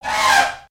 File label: Pet Elephant Idle, creatures; creatures pets